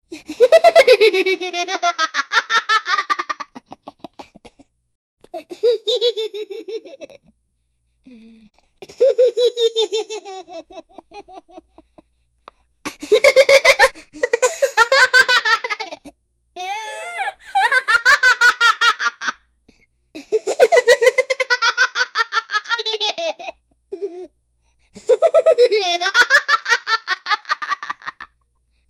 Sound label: 9 year old african, laughing